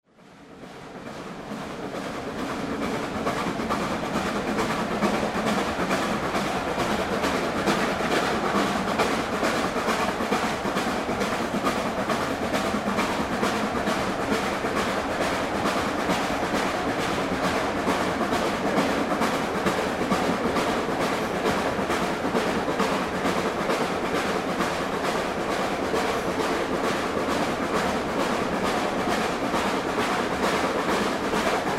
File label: Departing Leeds on train